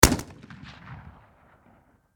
Rifle Howa M1500 Bolt Action Single Shot Close Perspective; Warfare